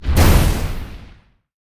Mech Tyranno explo; monster

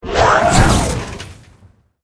ghoul berserk attack

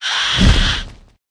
attack, monster

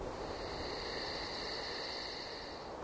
cemetery; portlux

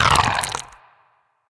giant scorpion damage, monster

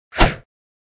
attk

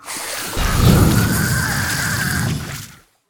snakeboss, monster

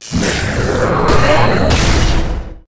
scholar ghost atk; monster

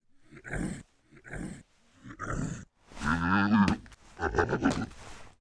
lancer idle

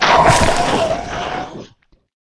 beetle death

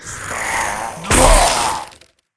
evli wizard attack

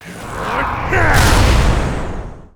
scholar atk; monster

